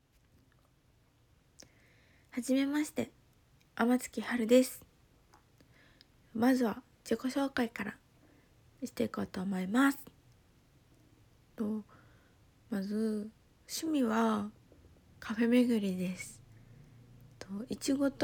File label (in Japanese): 初ガチ実演オナニー; THE FIRST ORGASM